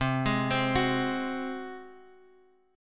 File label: G sharp 7th C